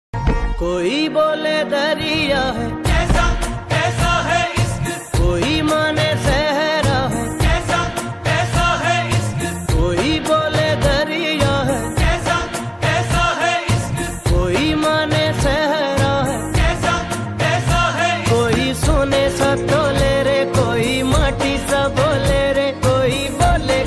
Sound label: bollywood song ringtone; film mere brother ki dulhan